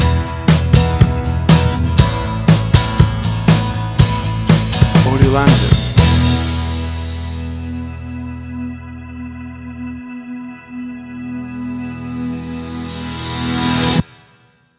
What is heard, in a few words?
Bold and Big Indie